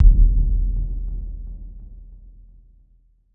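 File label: Concert BD, bass drum; Roland BK-5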